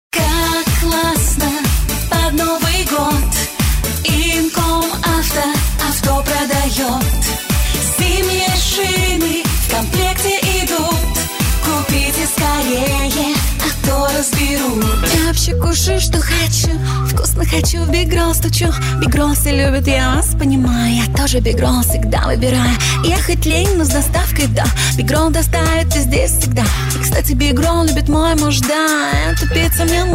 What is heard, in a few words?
voc